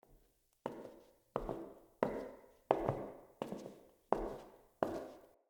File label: Foley; Attendant; Warsong Prologue